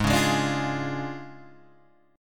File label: D Minor Major 7th Flat 5th, Ab